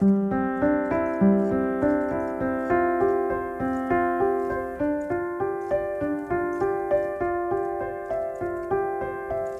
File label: Low Tide 100 C Piano Riff, Ambient Piano Loops; C and Dm Night Mood